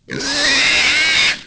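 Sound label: scream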